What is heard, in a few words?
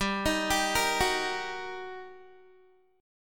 G Minor Major 7th Sharp 5th, G, down, Guitar, 4E 4A 5D 5G 5C 6F; GmM7#5; arpeggiated